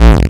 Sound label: Offbeat Bass A; Vengeance Samples